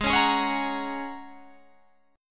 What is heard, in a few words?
A sharp Minor 7th Sharp 5th G sharp, chord